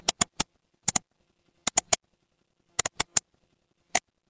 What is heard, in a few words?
office mouse click